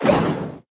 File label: enemy lava basic flame; sfx